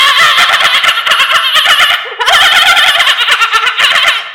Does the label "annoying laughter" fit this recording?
Yes